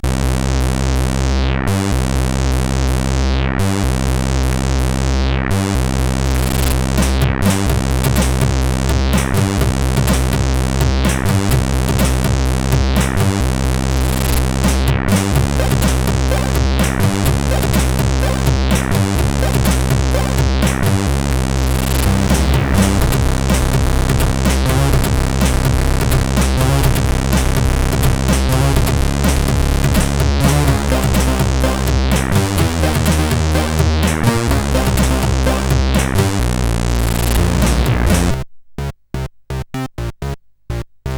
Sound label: Rap, CSG8580R5; sid